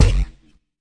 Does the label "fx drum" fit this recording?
Yes